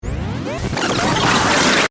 Stinger Radio, Stinger, Radio, Tape, Rewind, Ascend; Production Element, Imaging Element, Accent, Transition; BlastWave